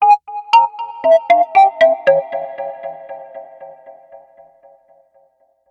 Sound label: ring; Punkt